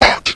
AIRLOCK

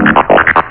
ROBOT; Flashback Demo

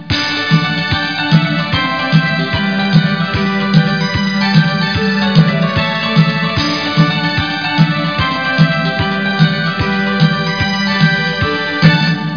tune; Design It! 3D

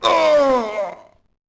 hurt; ragnar; blade

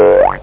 boink; TPUG Users Group CD; AMIGA

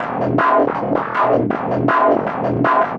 DRUMLOOP D+B 160, Drum+Bass Loop; eJay

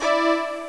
VIOLA

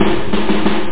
END TURN; stratego

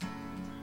menu popup; Oasis

